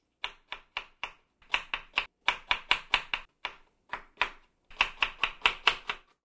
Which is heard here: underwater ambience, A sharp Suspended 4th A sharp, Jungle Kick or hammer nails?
hammer nails